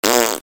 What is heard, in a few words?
Raspbery